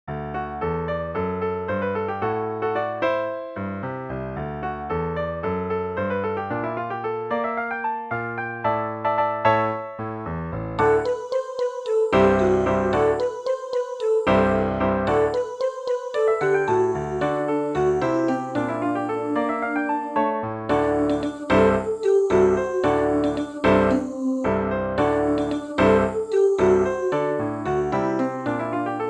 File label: Alto